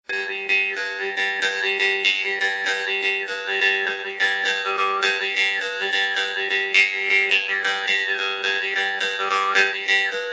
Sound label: Jews Harp